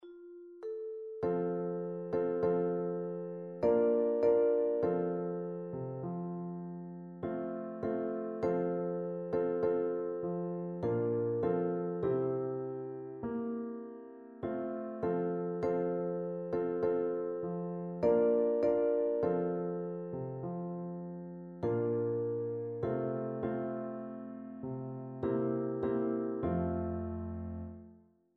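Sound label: kumbayah, vibraphone; percussion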